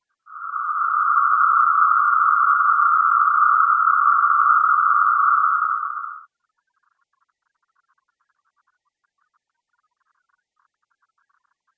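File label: noise 1.25 kHz; Magnitola Avtozvuk; shum pod fazy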